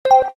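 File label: Keytone clear; LG SV850 Wine 4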